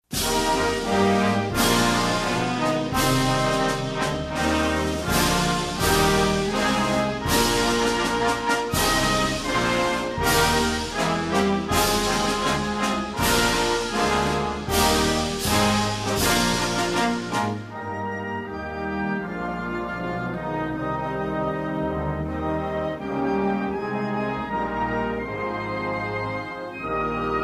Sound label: anthem